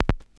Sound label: VINYL START; Best Service Advanced Media Trax Modern Composer